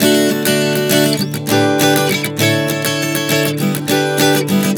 D-G-A-G 100 bpm, acoustic guitar chord progressions